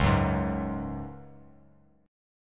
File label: C sharp Suspended 4th C sharp down, chord; strummed; JGuitar